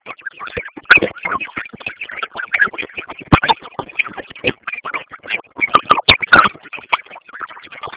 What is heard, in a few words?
hit; freak fortress 2; mac